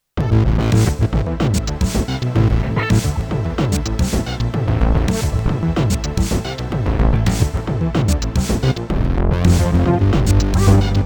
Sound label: LoFi 12XT Pattern